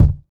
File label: round kick drum sound d sharp key; kick drum samples